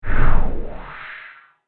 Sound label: Whoosh Moving; Whoosh Sound Effects; sound design